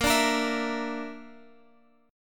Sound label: Bb Major Flat 5th Bb down Guitar Open G, chord